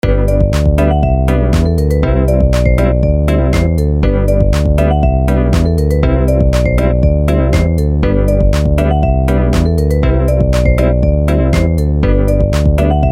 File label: ringtone